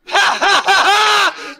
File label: ha ha ha; Voice; ooh ahh